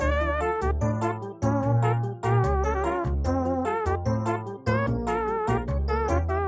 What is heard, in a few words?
Elevator Jingle Loop; Lethal Company